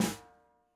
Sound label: snare drag, gretsch kit